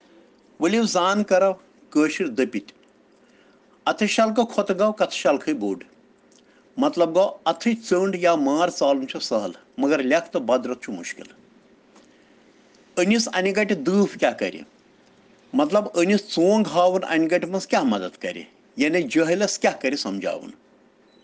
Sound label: Children Rhyms in Kashmiri